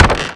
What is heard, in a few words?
Mummy Coffin Open; Playable Tutorial Level